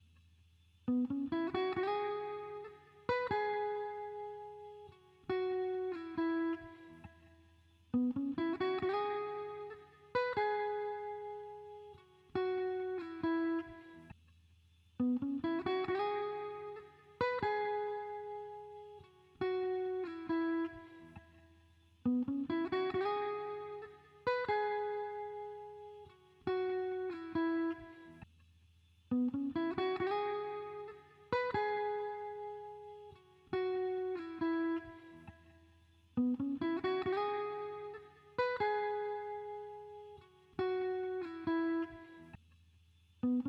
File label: 136 BPM E Maj Guitar Layer; Loop Stems; Cymatics; Utopia; Lovers